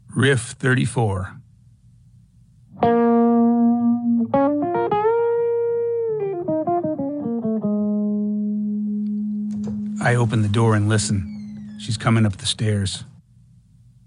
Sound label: Riff